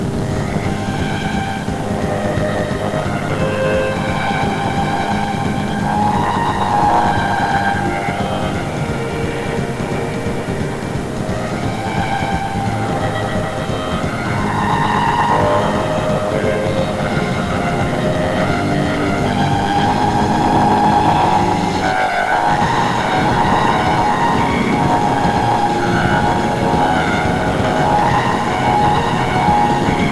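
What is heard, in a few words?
noise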